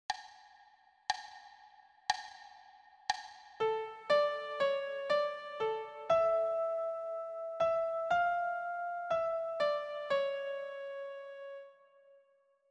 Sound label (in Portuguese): Re m piano